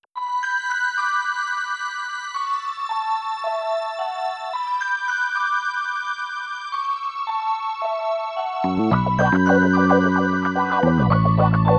Disco; the sims bustin out; gba

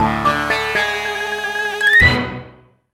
Minigame Fanfare (Bad); New Super Mario Bros. Wii

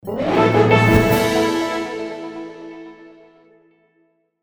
Ticket Get Jingle; Nyakuza Metro

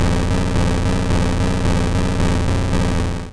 Tri Attack; sfx; attack moves; Pokemon; gen 1; rby

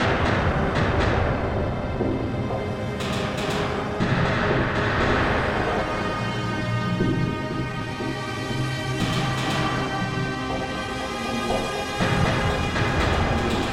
Danger Tonal; Insanely Twisted Shadow Planet

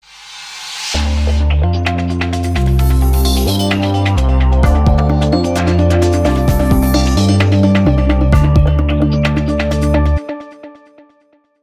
City jingle

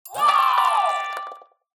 Correct Pattern

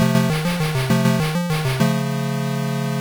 Wave Start; Dick Tracy; Master System

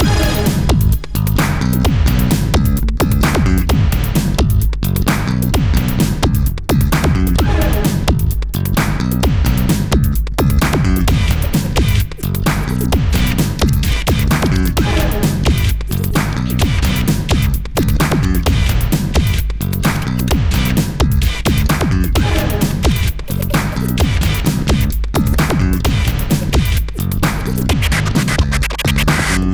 MUSIC BEATZ LOOP; Lego DC Super Villains 2018 Nintendo Switch

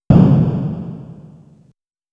My Car Crash

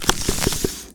Card browsing; Garfield's Diner; Android game music

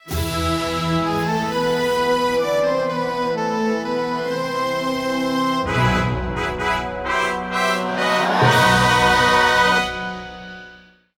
Course Intro Fanfare; crash bandicoot series sound box; Pyramid Pass

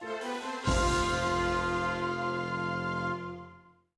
Piano; Haunted Mansion; gamecube

